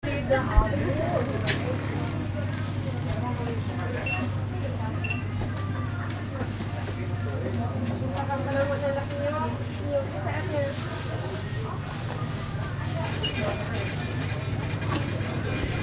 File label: shop, Indonesia street recordings